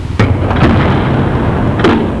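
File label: DOOR CLOSE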